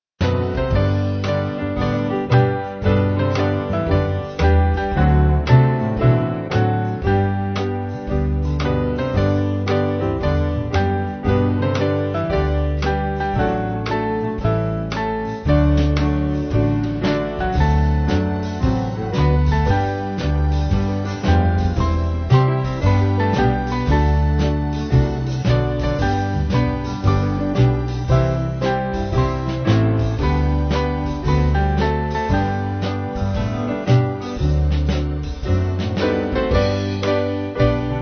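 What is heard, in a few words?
Band, Music Backing; There's A Royal Banner; McGranahan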